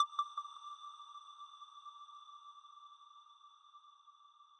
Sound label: arp